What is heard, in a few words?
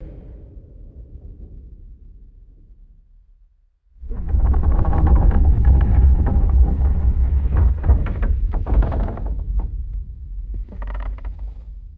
deep rumbling and heavy wood creaking